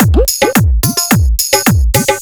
All BPM Style Dholki Beat

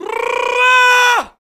Vocal

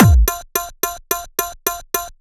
All BPM Style Dholki Beat

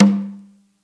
Dholki Tak Dram

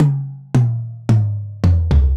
Dholki Beat

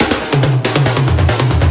Electro Fills

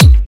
Hard Kick; Dj SES New Hard Kick Pack

